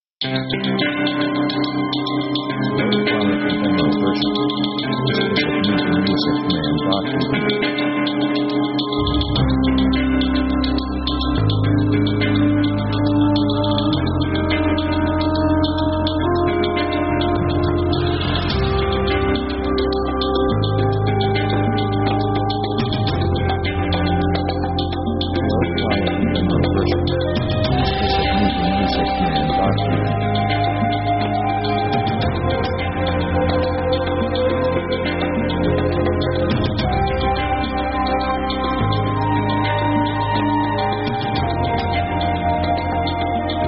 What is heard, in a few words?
orc broad adventure theme; orchestra themes